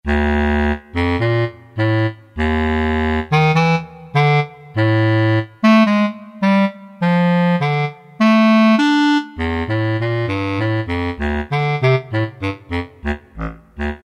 BASS CL; Orchestral Winds; Roland SN-U110-06

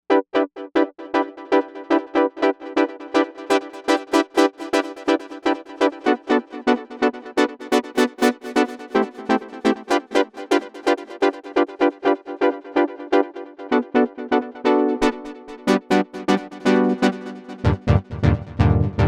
PoppiMouse, Novation UltraNova; Factory Patches

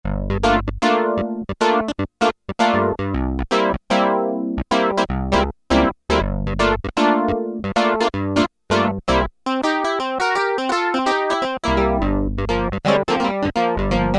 Clavichord, Roland Juno-60; Factory presets

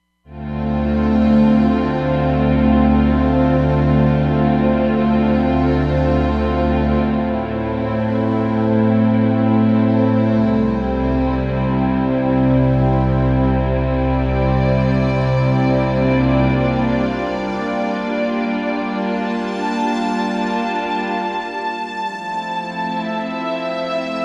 Native Pad, Roland Fantom XR

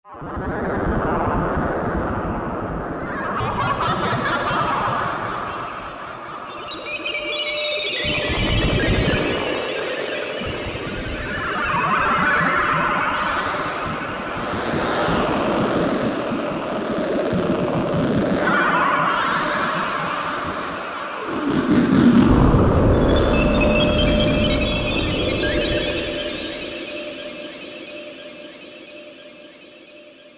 Roland V-Synth Alien Bubble; Factory Patches

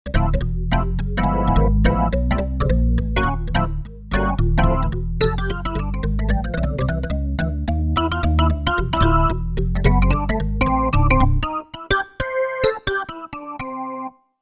Jazz Organ

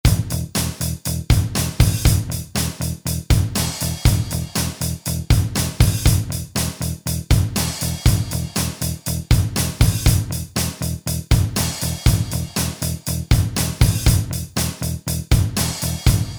Rock

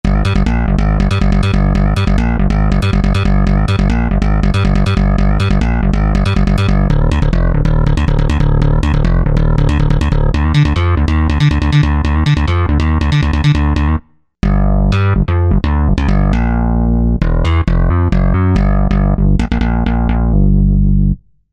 Razor Bass, Korg TR-Rack

